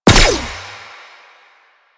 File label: Gunbrella Bullet Deflect, Weapons